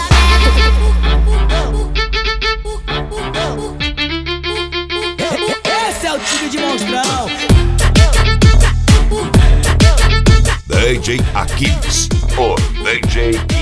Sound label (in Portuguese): hip hop mega funk